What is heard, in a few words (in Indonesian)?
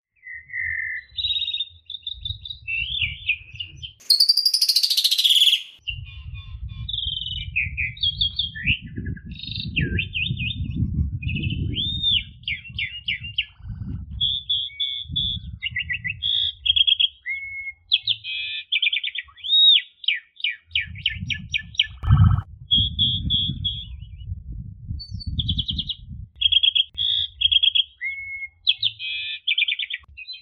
jalak suren masteran